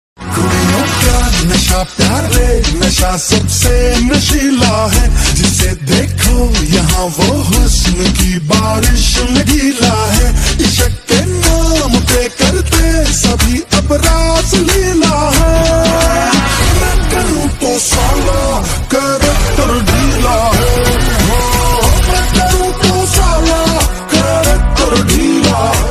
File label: lofi hindi ringtone